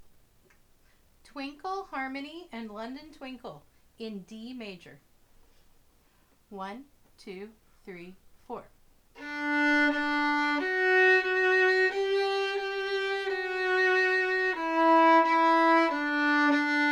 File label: Twinkle Harmony and London Twinkle in D Major